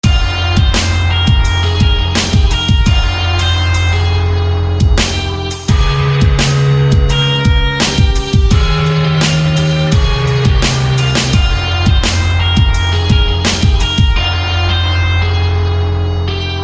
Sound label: Guitar; Banner; SFB FX